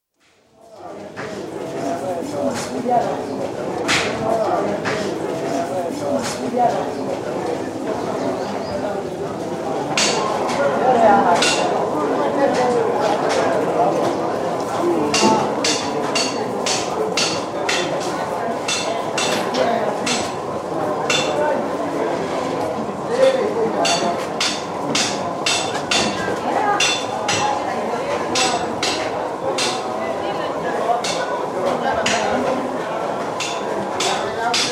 Kenya: Nairobi, fish & meat market, with knife sharpener, banging & clattering (Could be used as workshop, factory, etc); Outdoor Sound Effects; BBC